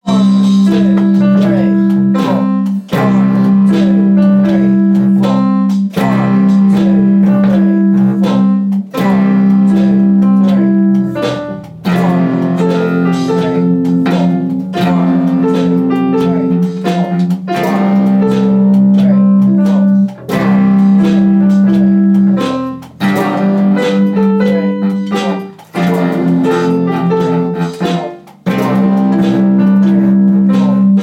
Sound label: 12 bar blues